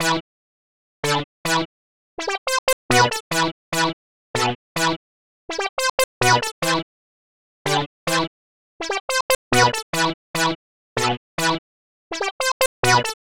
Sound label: 303 Lead Synth; Sonic Foundry (Sony Creative Software); Club Joints and Street Anthems